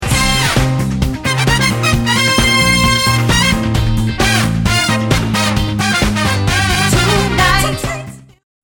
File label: Horns